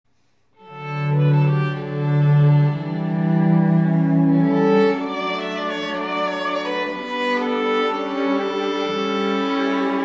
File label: Oboe; Gabriels